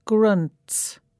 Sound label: grunts